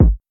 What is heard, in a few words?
puffy bass drum single shot g sharp key